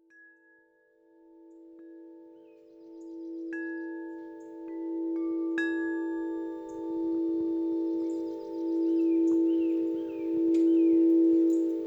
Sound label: Deep Forest Lounge Soundscape